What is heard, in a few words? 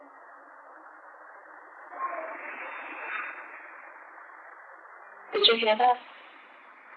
Corner Office Shallow people